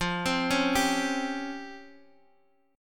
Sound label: Db Major Sharp 11th F